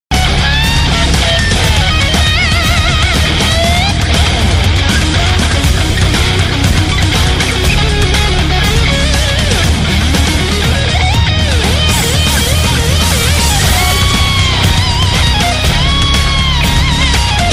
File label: Guitar Solo